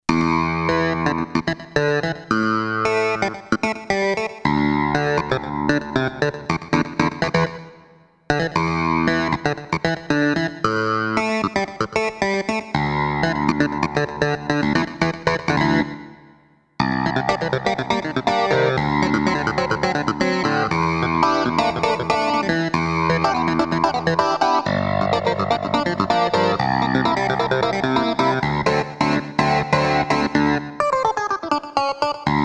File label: COMP CLAV., Roland SC-880; Factory Patches